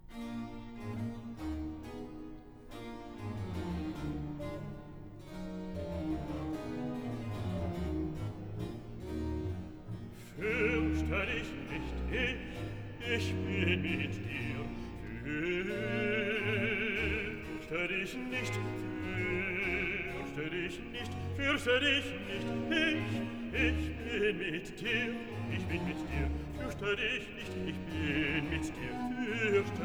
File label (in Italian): Arioso Bass; Bach; cantatas; Schau, lieber Gott, wie meine Feind